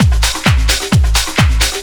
groove, DRUMLOOPS

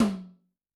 Yamaha DTXpress-II Tom

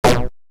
Shot; 90 sSampleCDs; Coldcut's Kleptomania VOL1

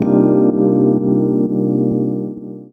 Chords Tones; Equipped Music; Loungin House

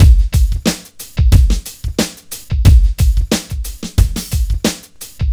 Street Tip, R&B Drums

